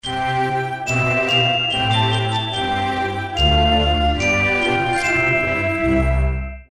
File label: Melody, RingTune